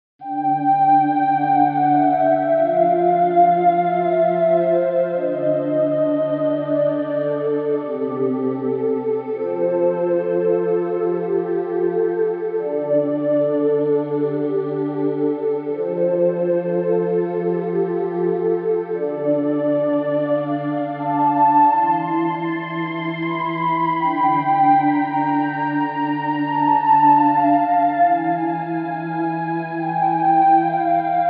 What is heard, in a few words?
Spooky Forest